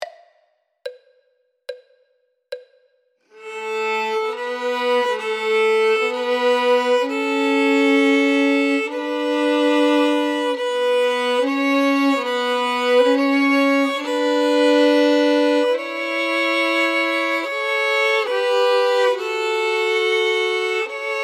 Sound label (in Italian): violino I e II